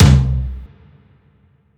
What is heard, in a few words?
TOM, Jungle; Gorge Sample Pack Vol.1; San Gabriel